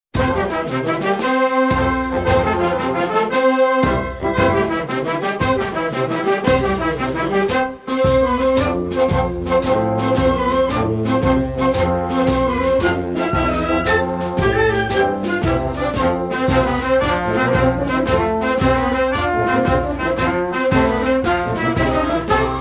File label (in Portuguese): Hino de Jundiaí instrumental; a cidade